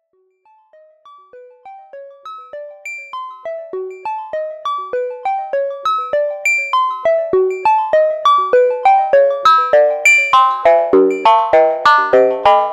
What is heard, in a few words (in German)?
synthwerk crossmodulation